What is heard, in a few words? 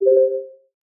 dialog warning auth; stereo; kde; ocean